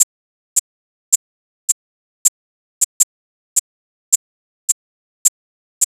Grid Shake 80, Percussion Elements

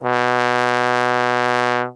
B1 BASS TRB, Brass; Best Service; Brass Super Section

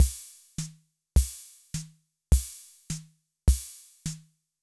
march, Yamaha MR-10

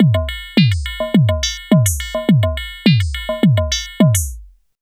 SYSTEM 100; AMG - Vince Clarke Lucky Bastard

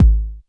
K909, KICKS TR 9+8

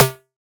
Snare, Drums; Bitkits

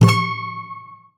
SPINET; Xsample VOL-7

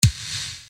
Bass Dr, EuroDance Bdrums

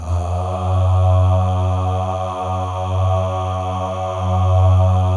MALE AHS; Spectrasonics; Symphony of Voices

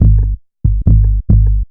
Dogon Maqsuum Filtered Beat; Sonic Foundry (Sony Creative Software); Loop Noir

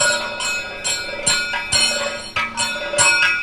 KATAK, Indian Traditions; USB Soundscan vol.45; AKAI

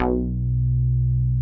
MINI, MOOG BASS

